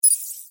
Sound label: subtle tech interface